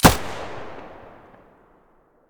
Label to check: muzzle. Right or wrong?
Right